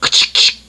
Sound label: handgun reload; cinema